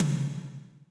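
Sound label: tom, drums; Best Service; Real Mega Drums VOL-1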